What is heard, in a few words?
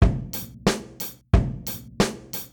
4 4 rock beat